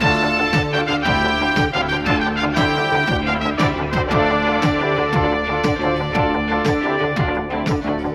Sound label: horns, phonky loops